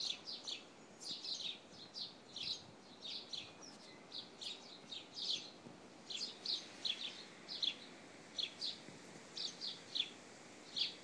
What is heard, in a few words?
baby sparrows cheeping